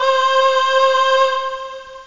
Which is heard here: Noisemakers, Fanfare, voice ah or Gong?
voice ah